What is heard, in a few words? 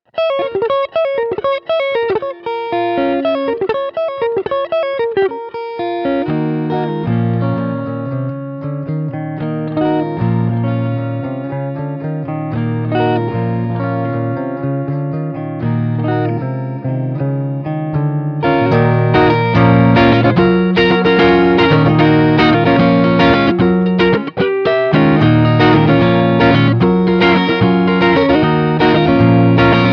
Neck PU Clean